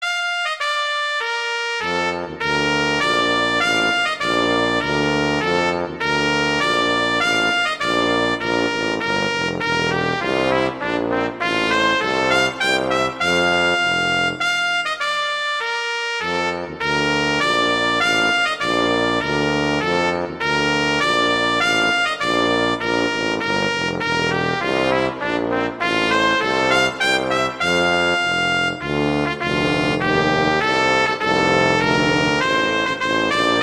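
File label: Duet, horn; Mozart; Duets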